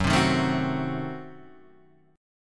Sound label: F sharp Diminished 7th F sharp Guitar Standard; strummed; JGuitar